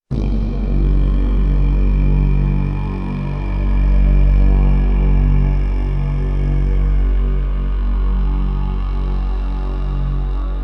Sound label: Scary Sound